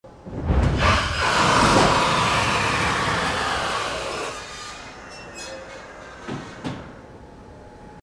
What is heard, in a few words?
ringtone landing Gear Drop